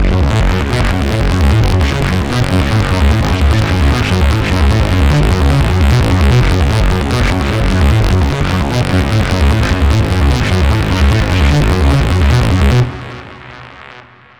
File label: Reese; teknovault hard techno vital presets vol 2; The Master